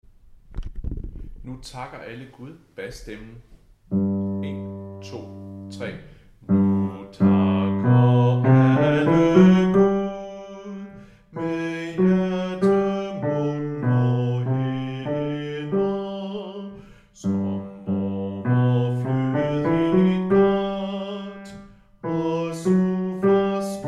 bas, koret; Nu takker alle Gud; harlev koret

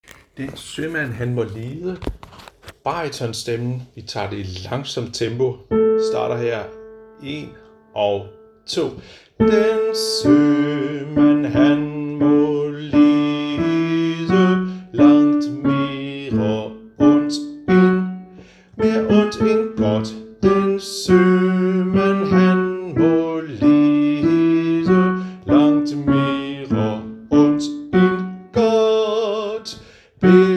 bas; harlev koret

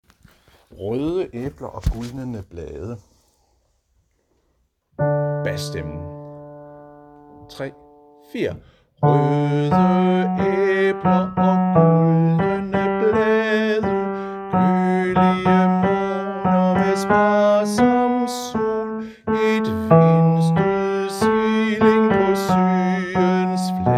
bas; harlev koret

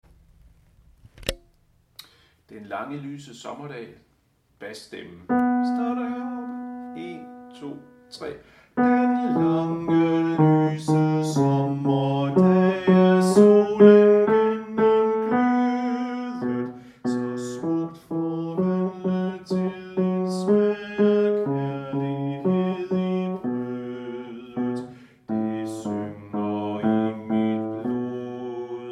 bas

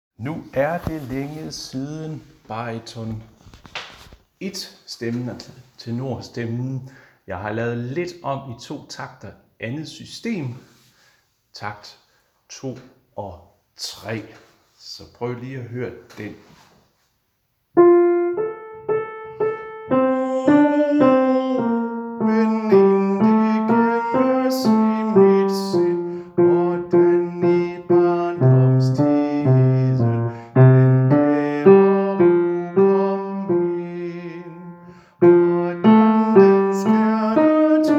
Baryton, koret

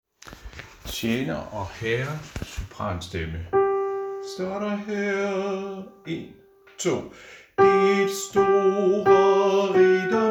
sopran; Tjener og Herre; harlev koret

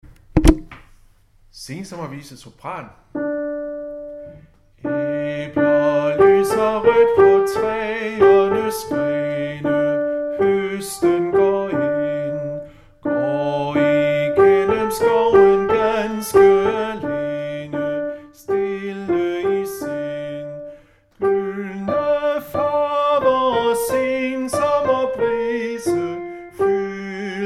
sopran, harlev koret

